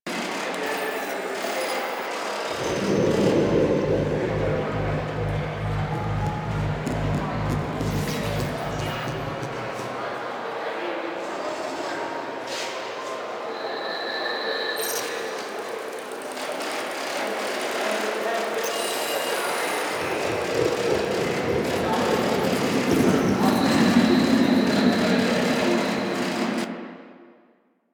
Hub Concourse Fragment Amb Loop, Ambience; Warframe; Tenno Hub Concourse Fragment

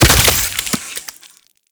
Uzi Explosion; Infested

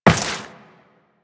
Step Metal, Footsteps, Walk; Warframe; Tenno

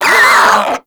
Infested Crawler Hear Sound; Warframe

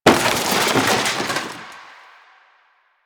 Wood Table Impact; Warframe; NineteenNinetyNine

